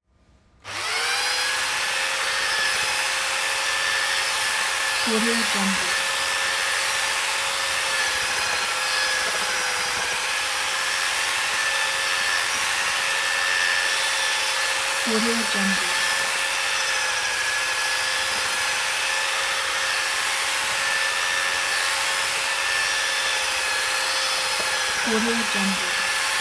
mixing dry mortar with drill

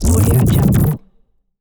boost; bogdansfx

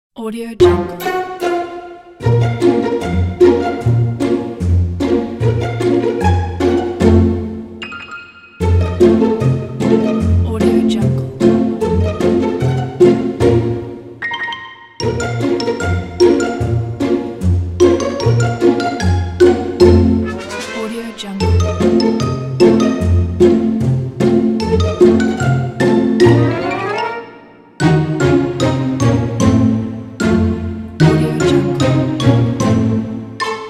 the comedy funny music

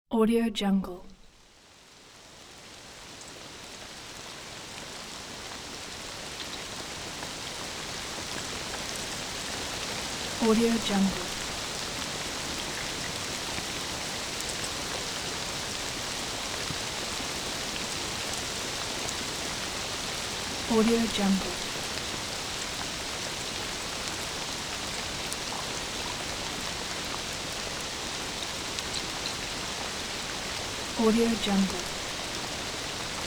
rain

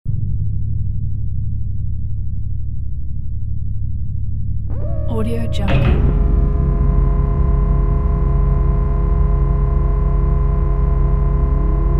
futuristic energy long ambience; hollywoodedge

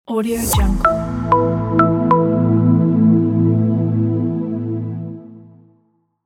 marketing logo

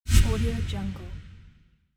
whoosh; fresh-sounds

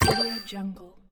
collect bonus

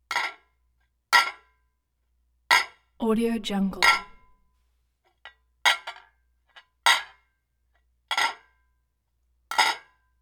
plates clink 2 or 3 dishes rattle together

